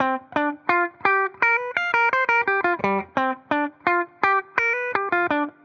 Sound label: Tele, Guitar, 85bpm, G, dusty funk; musicradar